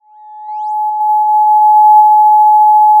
Sound of a police car siren

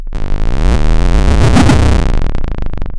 pop, chainsaw

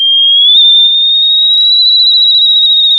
pop, sound of a tea kettle whistling

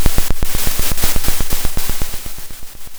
pop, Sound of a drum roll

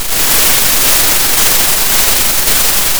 Sound of a thunderstorm

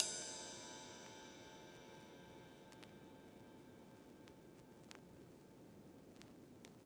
Vinyl Ride

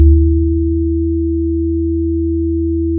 pop, Sound of a church bell

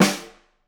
Flam, drum

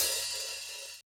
Op Hat, drum

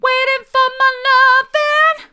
waiting for my loving mid, vocal

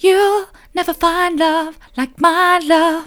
soft, vocal

